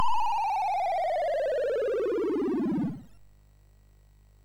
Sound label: SFX, MOS6581; Narco Police; MOS6581R3